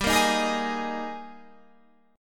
G sharp Major 7th Suspended 2nd

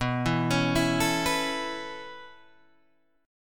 B 9th Suspended 4th B down Guitar Open G

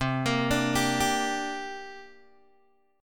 C 7th Suspended 2nd, Guitar, Open G

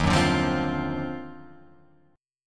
Eb Minor Major 13th, Guitar, Open F; EbmM13; strummed; JGuitar

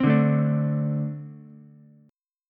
A-Minor Double Flat 5th A Ukulele; strummed; JGuitar

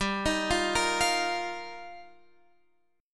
G Minor 7th Sharp 5th, Guitar, Standard; arpeggiated; capo 9; chordshape; x,10,13,10,11,13

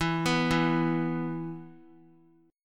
E 5th; arpeggiated; JGuitar

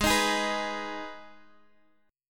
Ab Minor Ab, Guitar; strummed; 16,18,18,16,x,x; down; 4E,4A,5D,5G,5C,6F

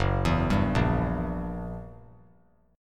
Fsharp 7th Flat 9th down, F#7b9 chord; arpeggiated; JGuitar

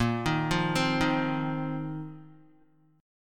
Bb Suspended 2nd Suspended 4th, Guitar; arpeggiated

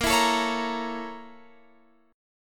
Bb Minor Major 7th Flat 5th, Guitar, Open F; BbmM7b5 strummed

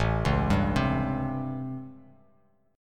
A 7th Sharp 9th; A7#9; arpeggiated; JGuitar

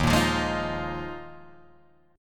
Eb Suspended 2nd Suspended 4th, Guitar, Drop D

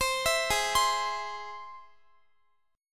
Ab Augmented Ab, Guitar, 4E, 4A, 5D, 5G, 5C, 6F; x,x,22,21,20,19; Ab+ arpeggiated

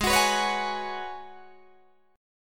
Ab Minor 11th, Ab, Guitar, DADGAD

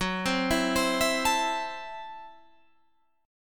C 6th Flat 5th Gb

